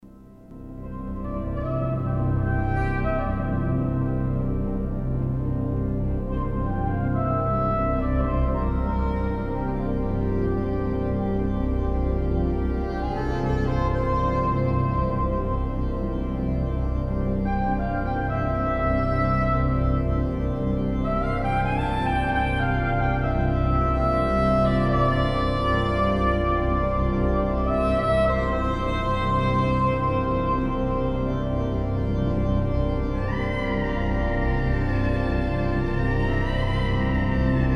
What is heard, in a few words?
Drone II